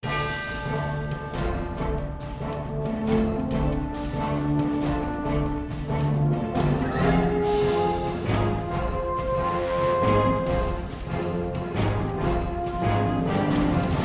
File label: battle